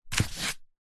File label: household book large hardback pick up from on top of another; zapsplat